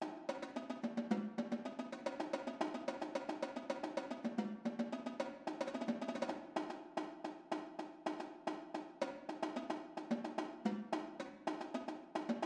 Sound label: tenor tryout